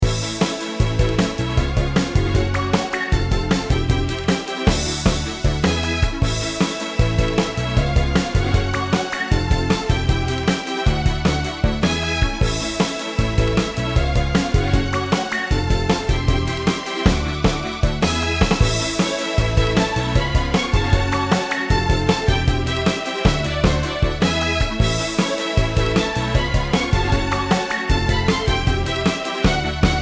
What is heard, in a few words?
End sabi All